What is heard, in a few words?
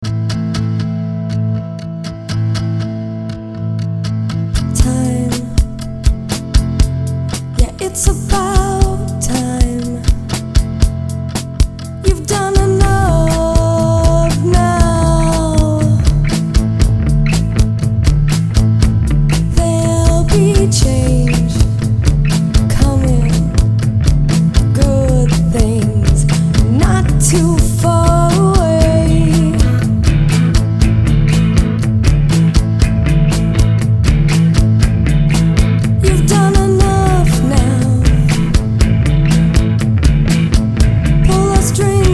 Vox Gtr Dist